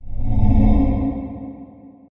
ghost; zombiestrd

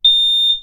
lock beep ok; realdoors

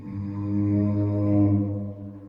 oerkki idle; creatures

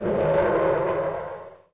roar; dmobs

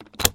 chest open; obsidianmese

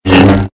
lightsabers swing; adv lightsabers

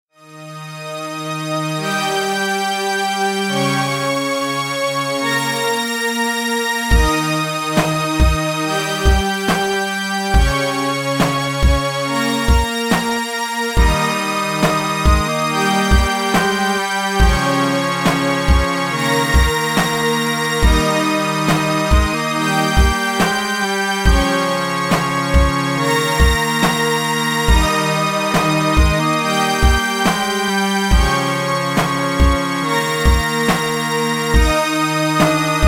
music; MinetestAmbience

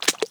mangrove mud; australia

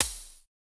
glass like break; grenades; zombietest

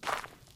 gravel footstep; minetestforfun game

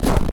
snow footstep; dragonfire; minimal

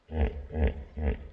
hippo; animalworld

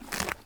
cow eat grass

